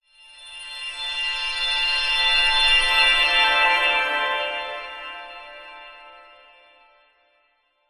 fantasy reversed backwards magical glissando

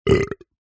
human male burp very short

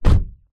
transport car door close internal toyota corolla

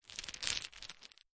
chain med sized handling; industrial; zapsplat

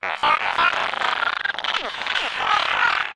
science fiction robot glitch malfunction; zapsplat

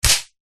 office ruler smack table; zapsplat

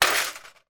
impact glass smash in metal tin

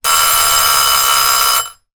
oven timer bell short burst

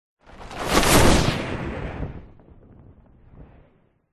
superhero fly by fast whoosh cape; fantasy; zapsplat

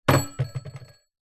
household alarm clock with bell knock over table

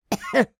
human cough 90 year old lady

